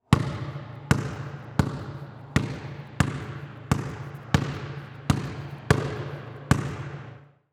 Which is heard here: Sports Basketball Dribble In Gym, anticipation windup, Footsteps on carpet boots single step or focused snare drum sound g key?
Sports Basketball Dribble In Gym